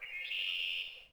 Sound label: distant bird; WAR